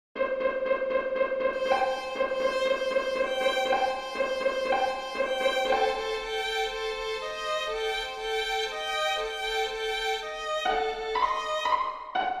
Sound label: chickens hens, for strings; Saint-Saens, Camille